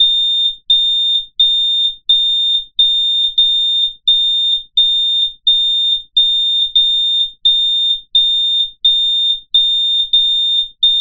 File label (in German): Hoher Alarmton; Alarmtöne